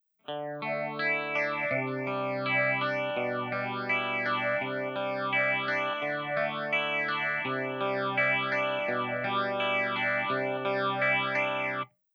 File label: phaser